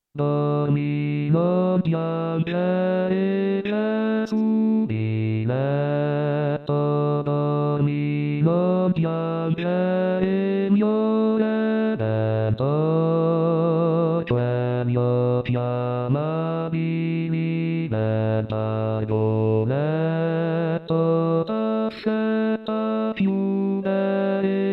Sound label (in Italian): Dormi Non Piangere Bassi; natale